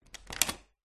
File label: household door internal wood open